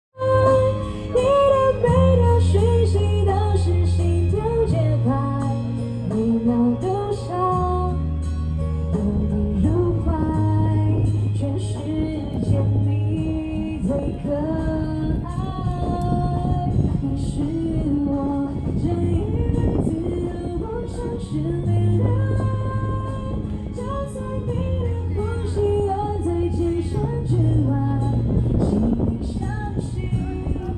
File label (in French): chant femme fete des lumieres, taiwan